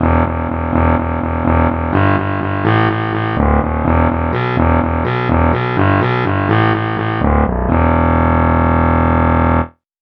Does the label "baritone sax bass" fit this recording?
Yes